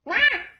raaar

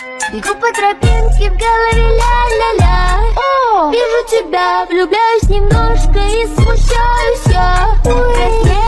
russian love song

